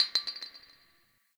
Garbage Glass Drop; Warframe; Nineteen Ninety Nine

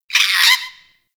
Forest Creature Squawky High, Ambience; Warframe; Grineer

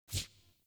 UI Theme Tooltip; Zephyr

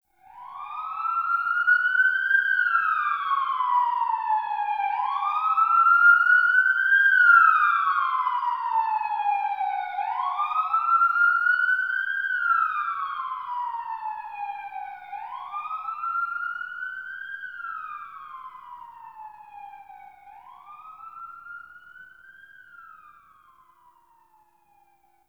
Exterior Distant Ambient Siren E; Warframe; Ambience; NineteenNinetyNine

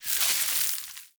Hoverboard Terrain Caster Snow; Warframe; Vehicles; Venus

